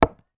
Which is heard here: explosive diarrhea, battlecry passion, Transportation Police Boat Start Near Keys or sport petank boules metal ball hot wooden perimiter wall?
sport petank boules metal ball hot wooden perimiter wall